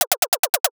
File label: sfx keyboard type short